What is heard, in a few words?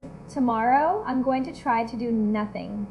beat